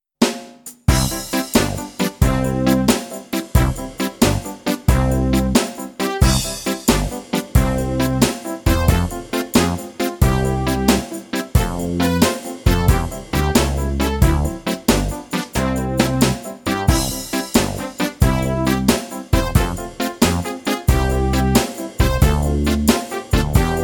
Instrumental

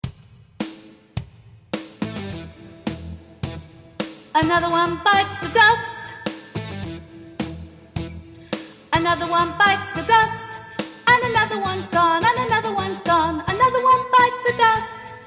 VOCALS

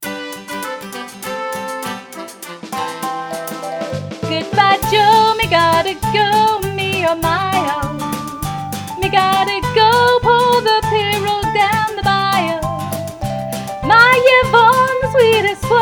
VOCAL

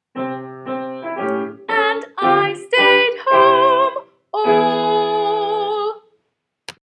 Key Change Only

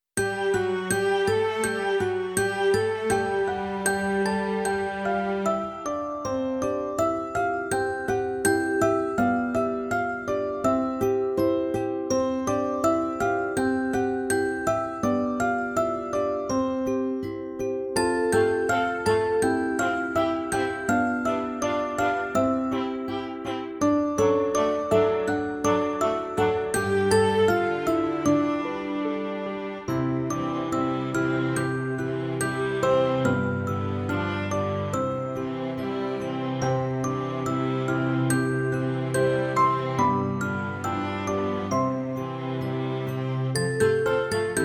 Instrumental

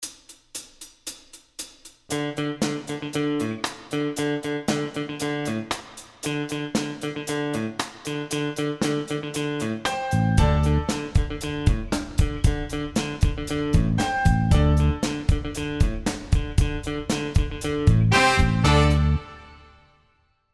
Riff

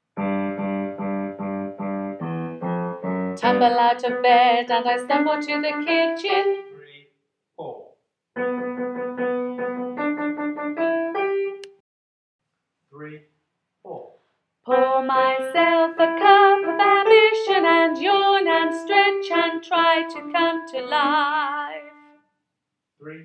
Echo Track

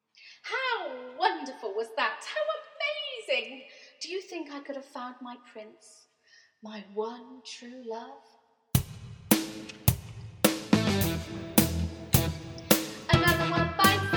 Vocals

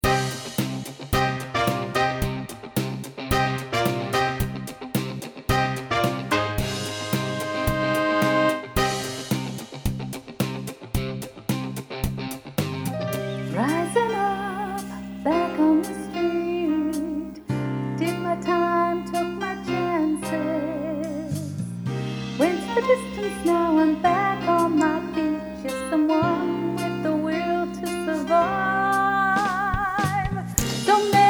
VOCAL TRACK

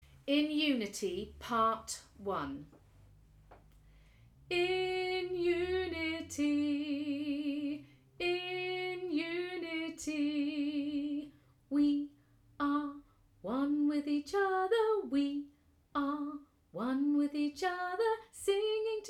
voice pno

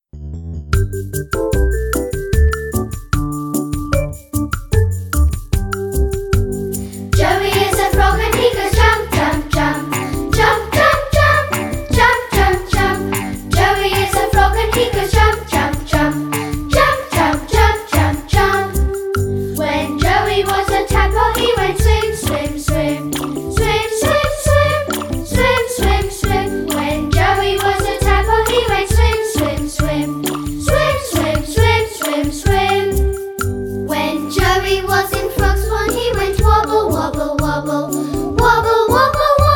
Vocal

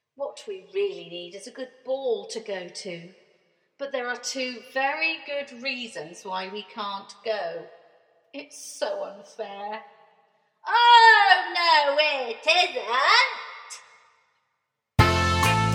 Vocals

